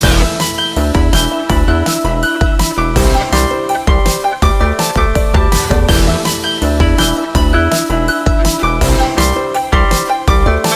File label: drum and harp guitar cover